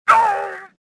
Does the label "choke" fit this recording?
Yes